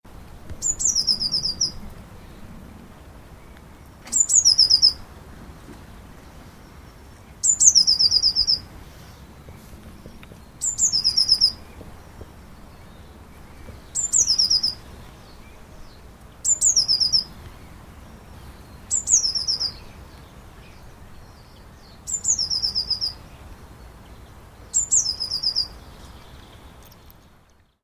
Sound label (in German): blaumeise; Gartentier des Jahres